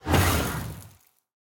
spawn, trial spawner; minecraft 1.21.5; block

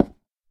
wood, dried ghast; minecraft snapshot; block

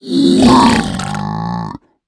converted, piglin; minecraft 1.21.4; mob

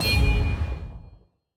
trident return; minecraft 1.21.4; item

